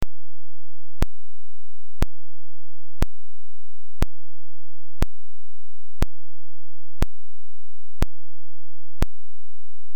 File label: pulse point5 hertz